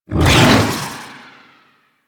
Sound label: Stalker Energy Sword Toss; Warframe; Sentient Stalker